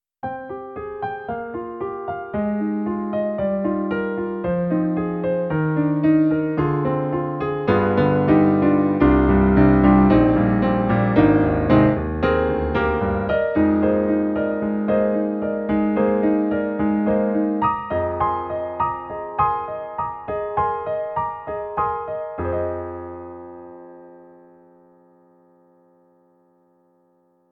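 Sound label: Perfect Piano; E-MU Sounds of the ZR Expansion ROM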